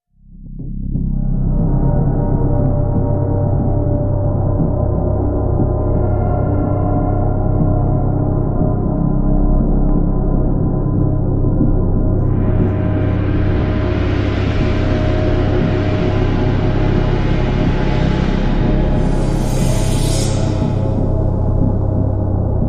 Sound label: Thriller Noise